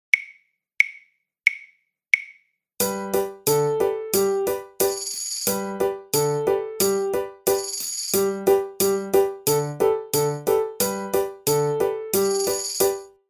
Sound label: Hot Cross Buns Backing Track